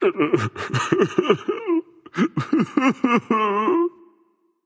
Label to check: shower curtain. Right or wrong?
Wrong